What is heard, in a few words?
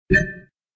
UI CHANGE BET; games; Dazzling Diamonds